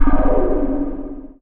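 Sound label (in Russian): кнопка цифровой в пространстве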